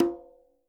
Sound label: SF QUINTO; Percussion; Sampleheads - NYC Percussionworks